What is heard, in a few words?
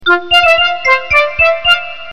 Correct